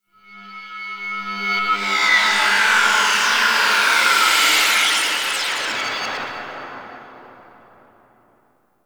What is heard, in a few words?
Undercroft Rev Delay Warp Space One Off; Warframe; Duviri; Ambience